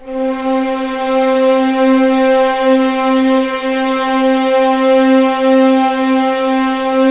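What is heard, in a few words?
C4 legato mf violins, left; East West; The Ultimate String Collection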